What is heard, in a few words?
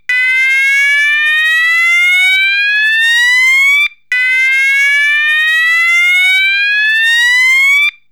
Sounder